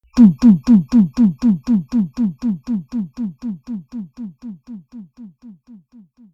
Drum Echo

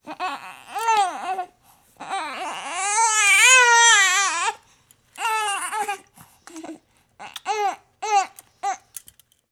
Baby cry

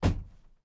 Thud Bang Close Hit

